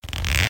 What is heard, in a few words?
Zip Down Sms